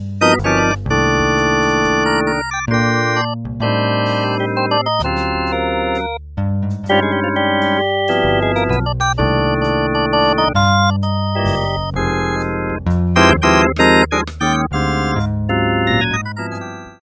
Farfisa Compact Organ Mode 4; Harmodion; Syntheway

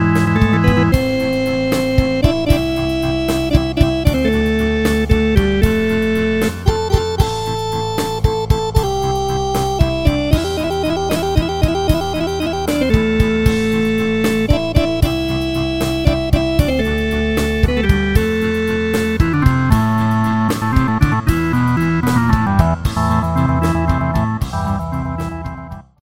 Vox Continental Combo Organ; Harmodion; Syntheway

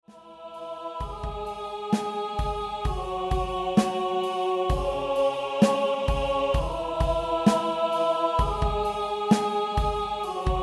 Choir Strings outro; Magnus Choir; Syntheway

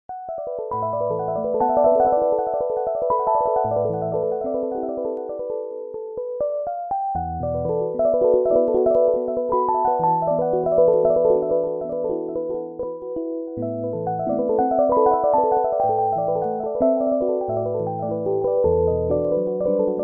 Hohner Pianet N; ElectriKeys; Syntheway

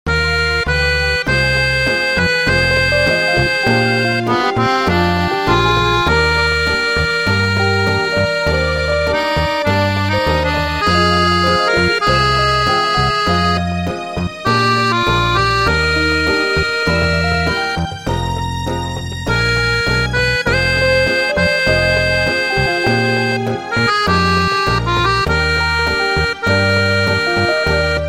Piano Accordion Banjodoline; Bassesland; Syntheway

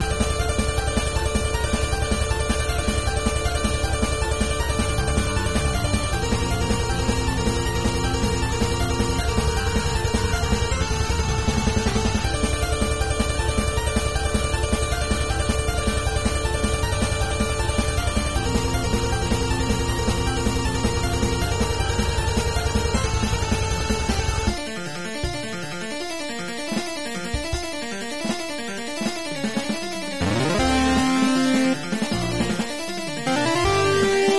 Harpsichord Hybrid Synthesizer; Lead solo; RetroMagix Harpsichord; Zephyrus Hybrid Synthesizer; Syntheway

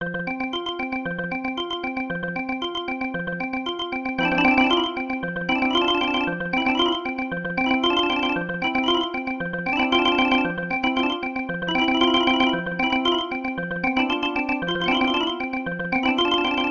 Percussive Organ; Organux; Syntheway

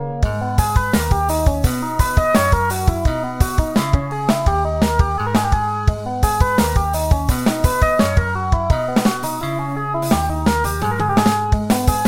LEAD Pulse Power; Phasewaver; syntheway

